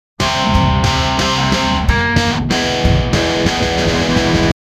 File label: sound effect with a rock